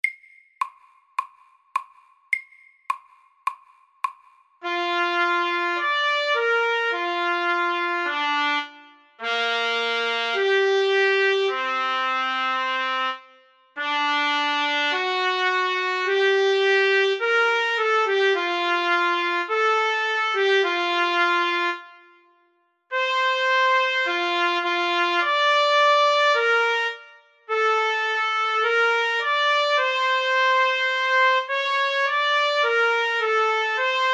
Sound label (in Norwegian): Vocalise MIDDELS